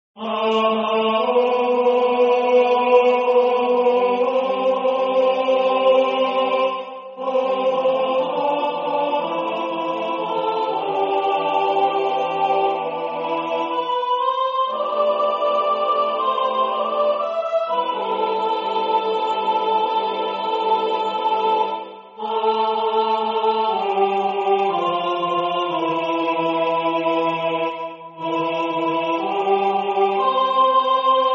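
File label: Soprano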